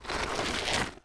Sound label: mole appear; Bugs Bunny - Carrot Chase